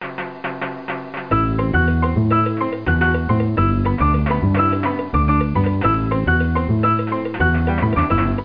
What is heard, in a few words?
rumba; GEOGRAFIA